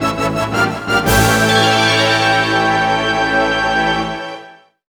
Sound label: Found It Jingle; Found It ~ Ruins; Drihoo